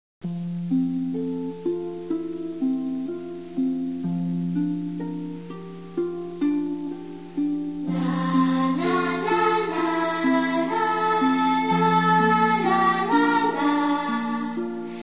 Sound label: theme short; poltergeist